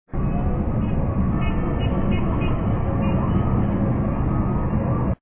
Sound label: Traffic